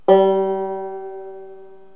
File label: banjo